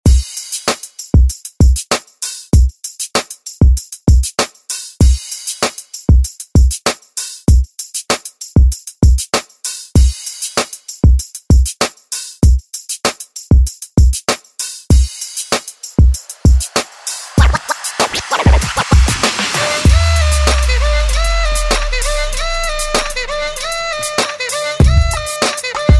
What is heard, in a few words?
Pop Redrum; Deejay Hit